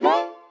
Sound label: Pitched Sting; Orch Perc and Stings; cartoon; musicradar; cartoon caper